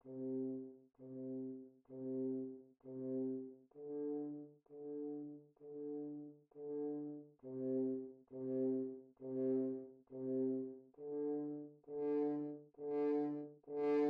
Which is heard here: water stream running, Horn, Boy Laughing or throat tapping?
Horn